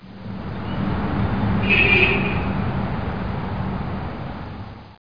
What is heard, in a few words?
traffic